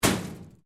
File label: Metal Plate Impact; Material components to create more complex sounds; Materials